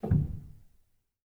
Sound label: rel, Grand Piano; bitKlavier Grand; Piano Bar